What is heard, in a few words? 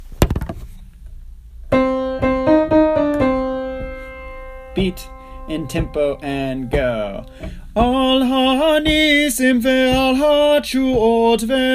Holy lights text w melody